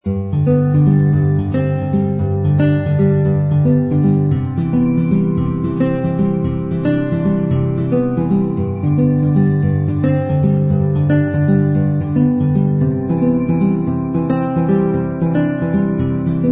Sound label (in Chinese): Intro, 这首以原声吉他和钢琴为特色的歌曲充满了感伤、圆润和温暖的光芒。; 夏日温馨套装 Warm Summer Kit